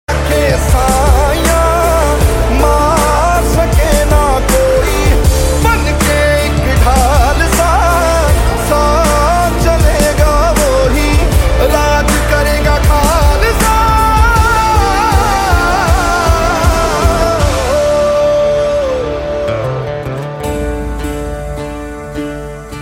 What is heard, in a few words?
song cell ringtone